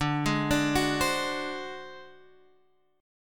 D 7th Flat 5th D down Guitar Standard, chord; D7b5 arpeggiated; JGuitar

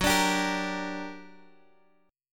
G Minor Major 7th F sharp

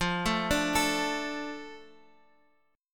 A Suspended 4th Sharp 5th

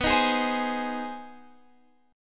Ab Major B; strummed; Ab/B; JGuitar

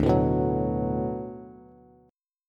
D 6th D down Bass, chord; D6 strummed; JGuitar

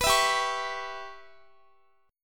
G 6th Flat 5th, Guitar; G6b5 strummed; x,x,21,21,19,20

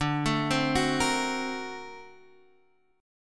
D 7th Flat 13th D, chord; D7b13 arpeggiated; JGuitar

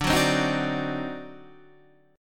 D 7th Flat 9th D down Guitar Open D

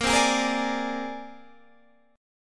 C 7th Suspended 2nd Sharp 5th, Bb

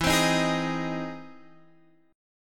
E Augmented Major 7th, down Guitar Open F; strummed

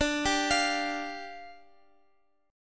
D Minor Double Flat 5th, down, Guitar, Open F; Dmbb5; arpeggiated

